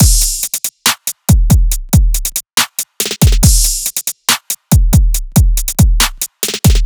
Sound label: Hip Hop Drum Collection Full Kit 140; Contour